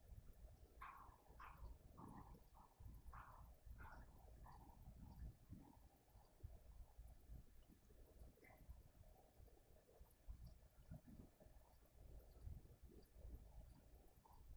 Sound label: Townsend Room Static; Irish-Townsend House – Investigation